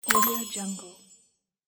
melodic button

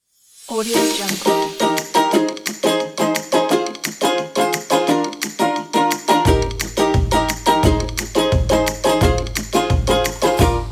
happy

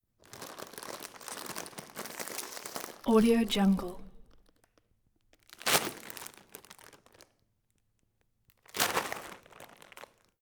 potato chip bag open medium size bag handle bag; prosoundfx

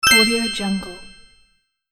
ding

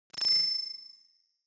ui sci fi hint

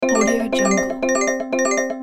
game point counter loop

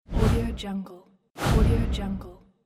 window in out

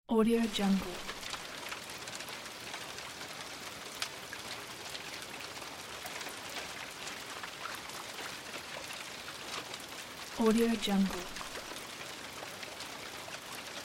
little rain

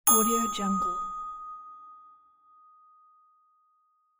ding

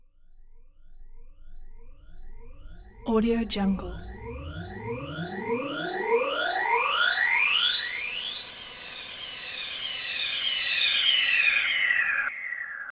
synth pulse grows

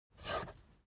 object office puncher squeak push down; smartsoundfx

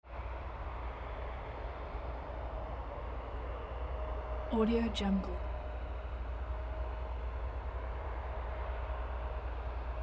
distant harvester is working in the field; mountainaudio

